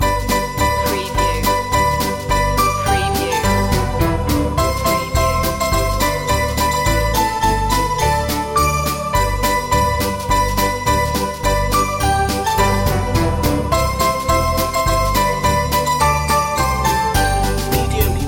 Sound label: jingle bells loop